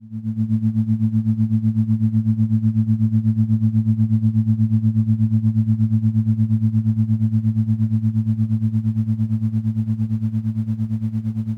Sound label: 7 83 to 12hz; meditations